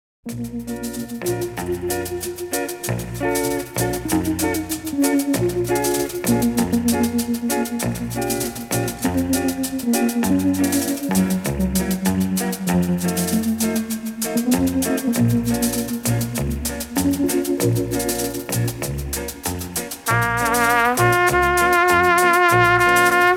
Melancholy Trumpet

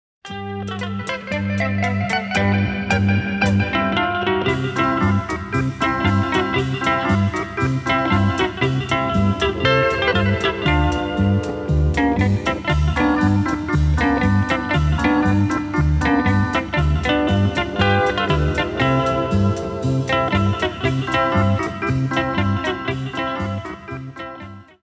Old Austrian Polka, Zither; 1968 Mozart Edition

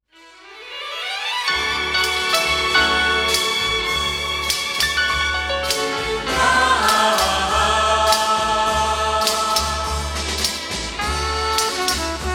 Orchestra and Chorus

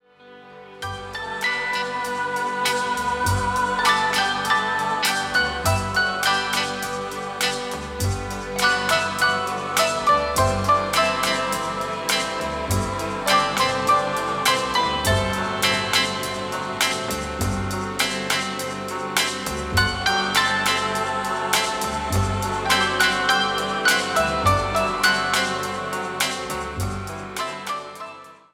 Orchestra and Chorus

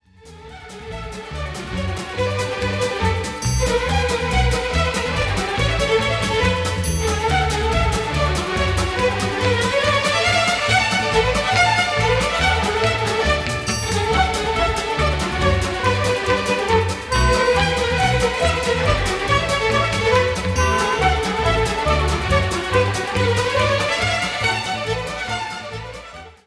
For Fiddlers Only, Orchestra

